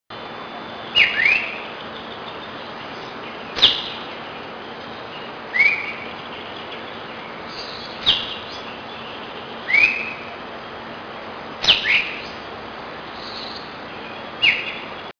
margarops fuscatus fuscatus jose luis monagas park puerto rico; West Indian